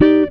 GTR CHORD; Univers Sons; Jazzistic

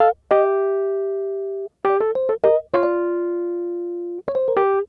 Smooth Groove Rhodes, 70s Analog Funk

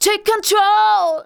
vocals sung; Techno Trance Essentials

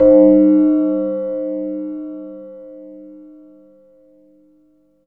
Synth Bells; Dream Zone

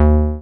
303 Short Release; Zero-G Analog To Digital 1 & 2

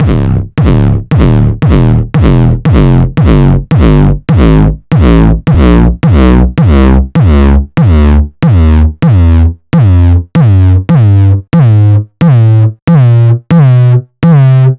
909 Grime Layer; Mbase11

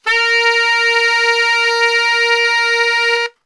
A# 2X SAX STR, SAX STRGHT; Best Service; Brass Super Section

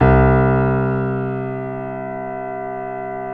PNO MF; Synclavier Sampler Library

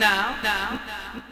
Fx Vox; Equipped Music; Loungin House

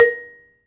SHORT VIBRA A#3; AKAI S-Series CD-ROM Sound Library VOL-7

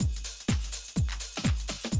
125, Classic Drum Loops

